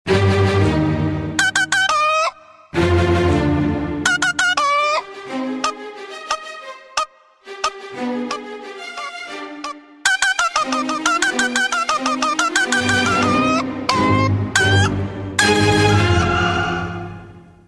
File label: Dramatic Drama